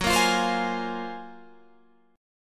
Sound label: Gb Major Sharp 11th, Guitar Open G